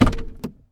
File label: Gearshifting; gamerip; Car Simulator 2; android